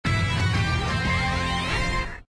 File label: Scene Transition Effect; Animated